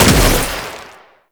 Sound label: Shot, Infested Arm Cannon, Weapons; Warframe; Coda Bubonico